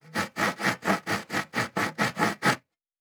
Saw Wood; Fantasy Sounds Bundle; Craft

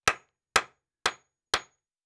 Nail; Fantasy Sounds Bundle; Craft

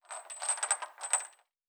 Chain; Fantasy Sounds Bundle; Craft

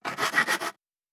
Saw Wood; Fantasy Sounds Bundle; Craft

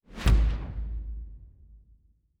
Special Click; Fantasy Interface; Fantasy Sounds Bundle

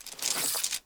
Weapons, Bow, Grab Arrow, Drag, Pull, Bag Rustle; DeltaVR; FMOD; Objects Pickable

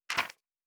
Book Page; Fantasy Interface; Fantasy Sounds Bundle

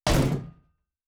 Shield Metal; Fantasy Sounds Bundle; Medieval Combat Sounds

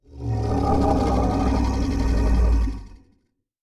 Large Creature Long, Monsters; Fantasy Sounds Bundle; Large Creature 13

